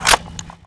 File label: m4a1 clip in